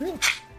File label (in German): "hatschi"